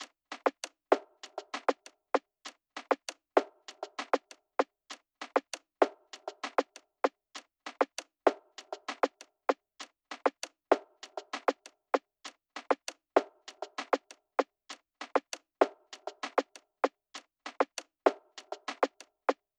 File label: Drum Loop - 98 BPM Percussion, Afrobeats; Loop Stems; Cymatics; PARADOX II - Drum Loop Collection; Artist Drum Loop